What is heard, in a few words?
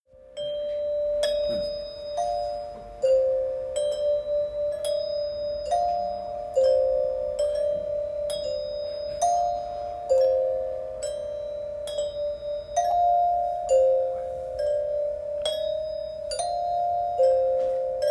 metalophone; Lycée Pablo Picasso